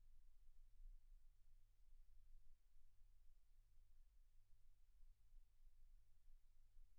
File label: silence 48kHz